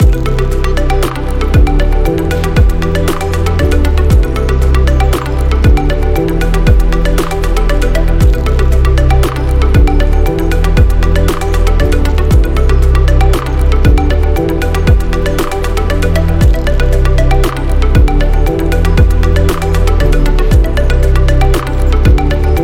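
Technology Loop Science, Modern technology background music; hi tech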